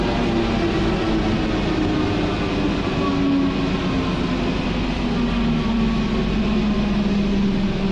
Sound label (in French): freinage, Metro